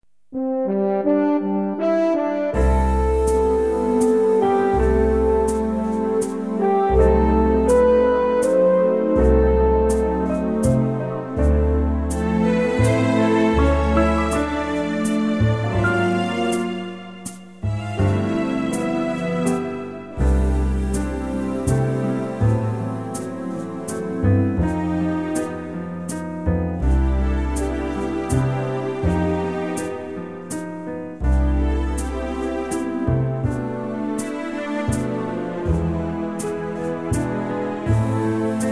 C waltz; key - C - vocal range - C to D (optional F top note); Waltz version

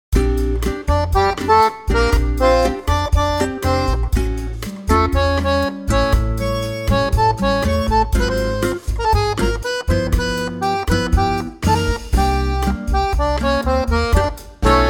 Bb accordion; key - Bb - vocal range - Bb to C; Reworked acoustic quartet arrangement, featuring wonderful accordion lines

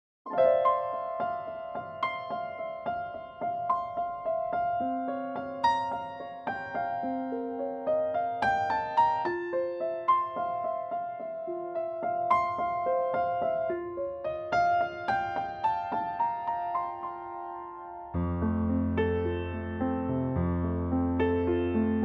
F piano; key - F; Pianotrak

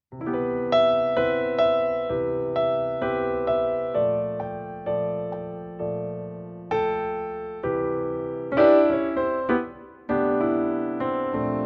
piano C; key - C - vocal range - C to D

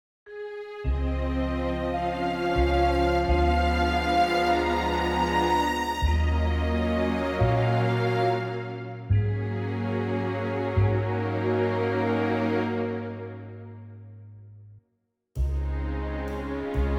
Db to D orchestral; key - Db to D - vocal range - Ab to E

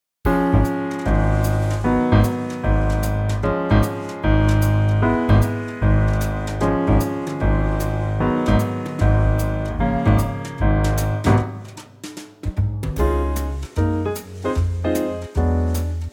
Db quartet swing

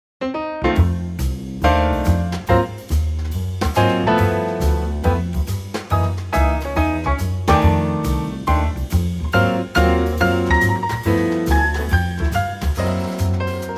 F Trio

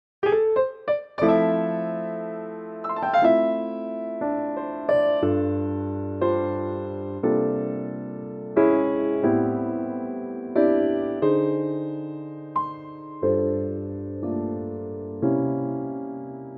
F Piano; key - F - vocal range - C to Eb; Superb piano only arrangement of this wonderful blues tinged standard, with the verse included

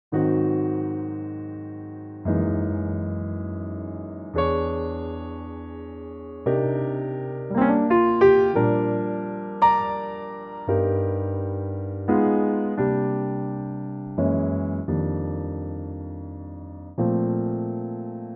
Bb piano; key - Bb - vocal range - F to Ab (optional Bb); Wonderful blues drenched standard in a very lovely piano only arrangement.

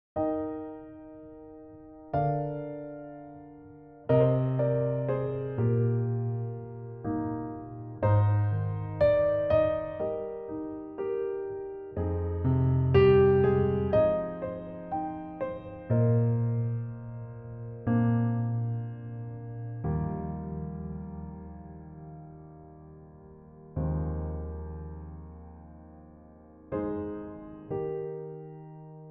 piano Eb; key - Eb - vocal range - Bb to Eb

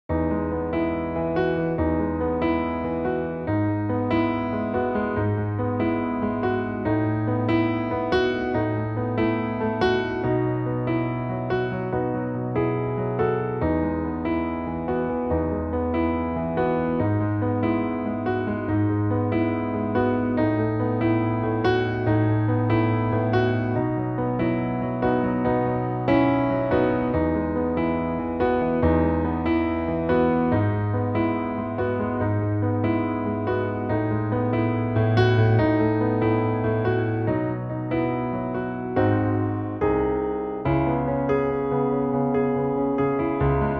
E F Piano; key - E to F; vocal range - E to D; piano only

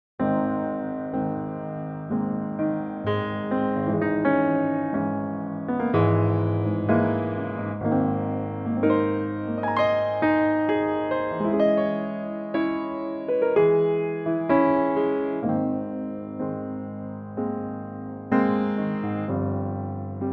piano Ab

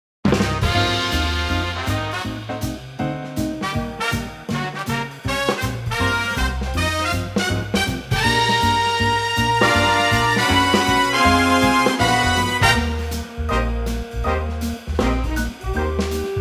Eb 160bpm

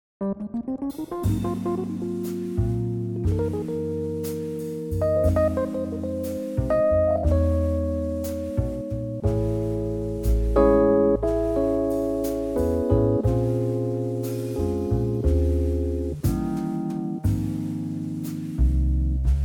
Rhodes Trio Ab

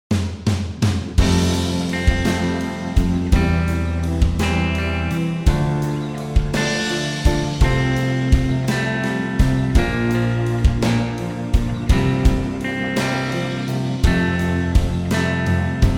no lead guitar

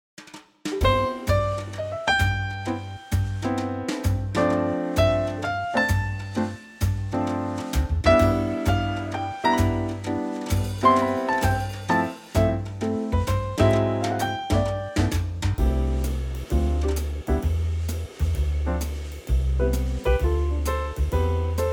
trio C swing

